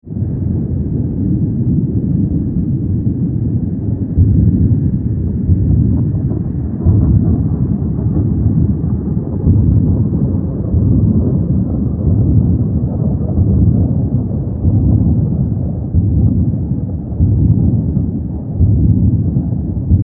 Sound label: Volcanic haka